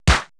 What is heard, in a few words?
Procussion; Best Service ProSamples vol.55; Retro Sampler; EMU E-III; Procussion Mix